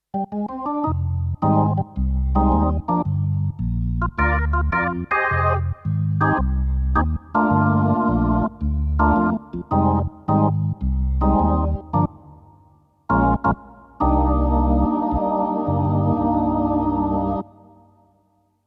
Yamaha PSR SX600 Jazz Rotary Orgel

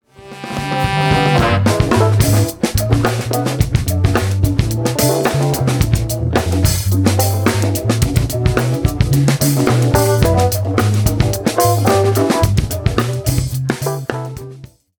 ohne Gitarre; Steinberg SpectraLayers Pro 10

